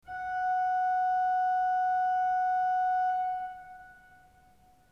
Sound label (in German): ton, orgel